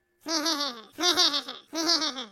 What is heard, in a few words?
hihihi